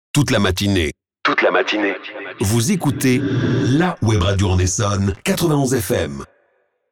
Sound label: Drop Jingle